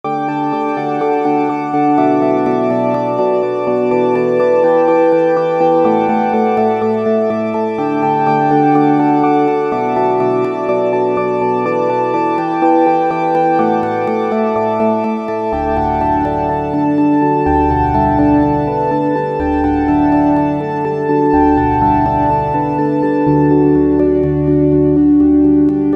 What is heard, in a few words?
sunrise keys; leaf by music sound software